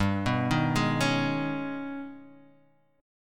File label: Ab Minor Major 11th